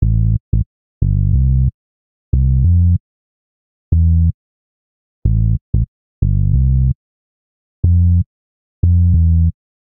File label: Bass A Minor 92bpm, Bassline; MIDI Clips; Beat That; Ableton; Factory Packs; Chop and Swing